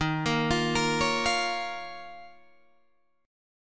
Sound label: D sharp 7th Suspended 2nd, Guitar, Open D